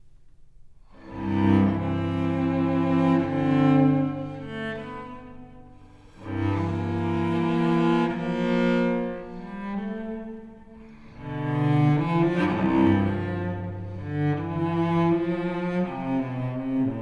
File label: Sarabande; BWV1009 Suite No.3 in C Major